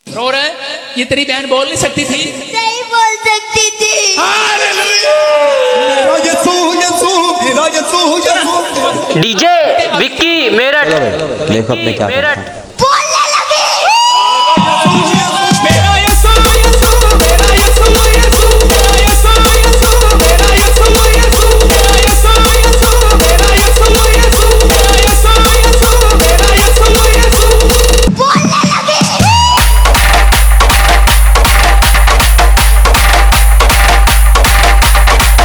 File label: EDM DROP